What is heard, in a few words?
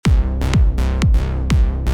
dotted eighth note